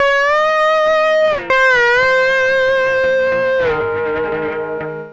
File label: Lap Steel; Trip-Hop